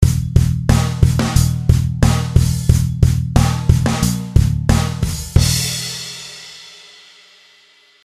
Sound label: bass pluck